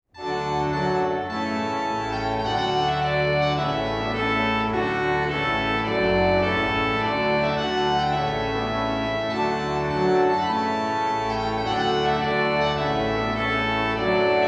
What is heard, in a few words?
Trumpet Tune; Purcell; wedding processions